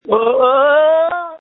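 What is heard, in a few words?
Scream, phone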